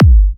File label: G, Kicks; markus hakala